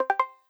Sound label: Open Or Enable, Modern UI SFX; Slides And Transitions